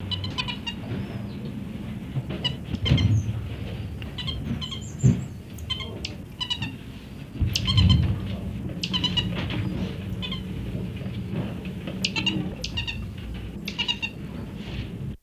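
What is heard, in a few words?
common marmoset, コモンマーモセット 鳴き声; 人間に聞こえない周波数の音声を出すことでも知られています。; 多摩動物公園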